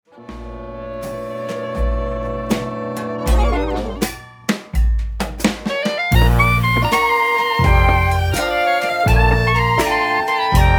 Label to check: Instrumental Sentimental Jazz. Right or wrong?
Right